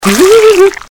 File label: evil pain; CAC ASSETS